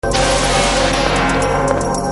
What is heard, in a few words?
post laser